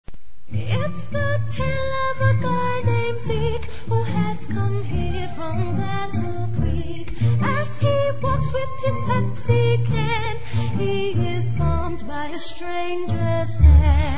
Pepsi commercial, ringtone

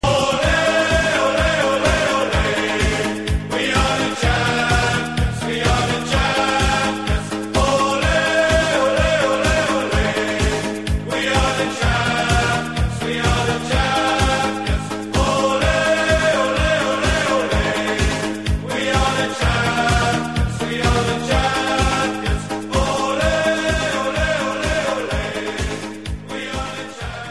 Soccer Song Ole Ole Ole

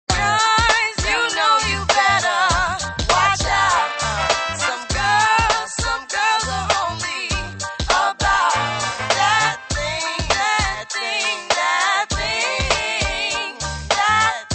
Doo Wop

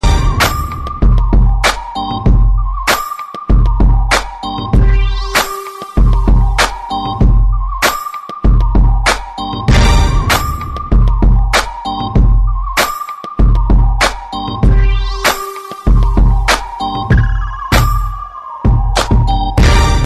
instrumental

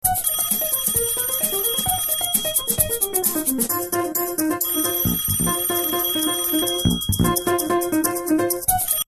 Piano

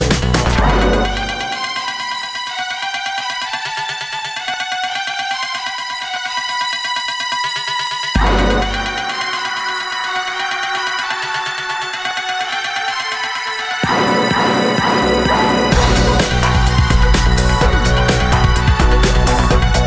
Techno, Ringtone

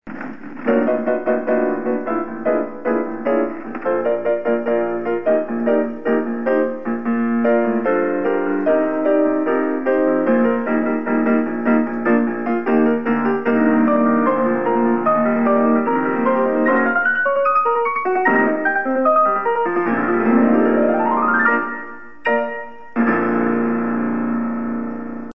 piano drunk; familyguy; peter

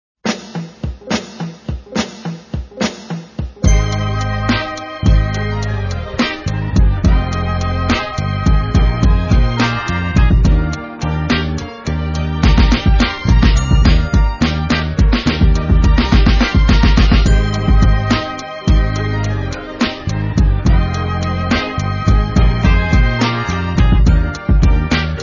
Instrumental

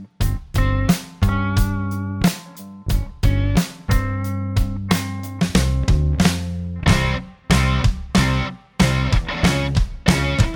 ringtone

